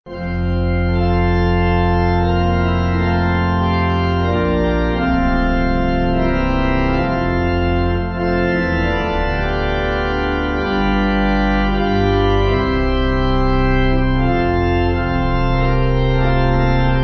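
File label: Pipe, Pipe Organ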